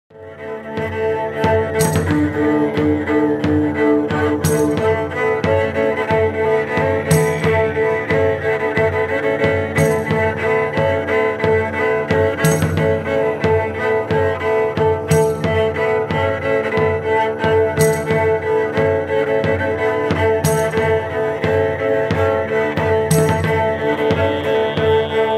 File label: rebec